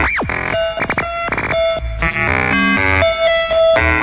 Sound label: 119 FM mod sequence, synth and electronic loops